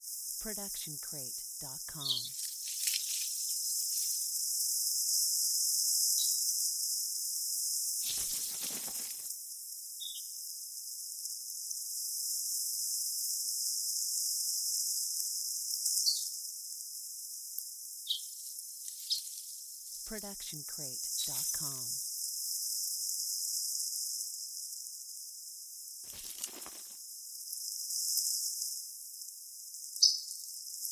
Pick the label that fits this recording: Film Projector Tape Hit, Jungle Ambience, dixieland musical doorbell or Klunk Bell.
Jungle Ambience